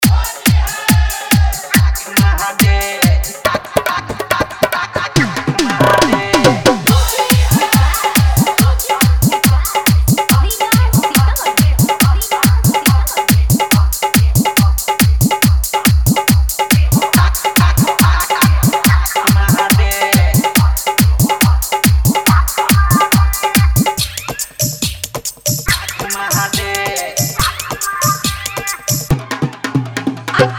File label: Hard Bass Dance Mix